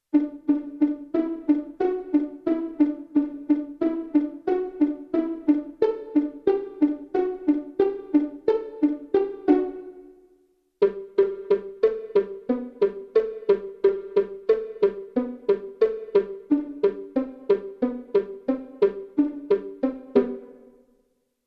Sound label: Violin Fun